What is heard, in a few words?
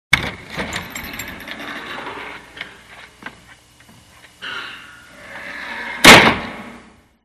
Screen door opens and closes; SOUND EFFECTS1